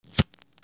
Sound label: FIRE